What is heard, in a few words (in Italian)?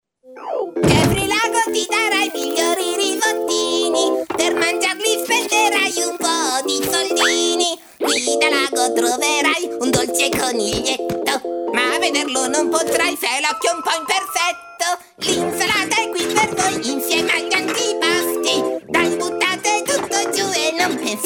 carattere cantato